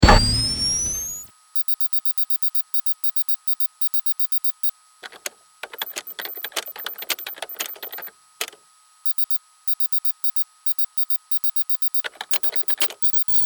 Science Fiction Data Entry Sequence Computer On Type Off; Control Panel